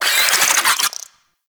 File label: Infested Ashen Vocal Attack; Warframe